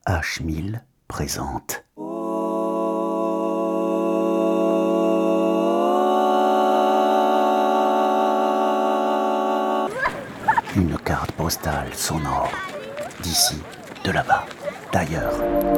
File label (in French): Rencontres entre l ocean et la foret des landes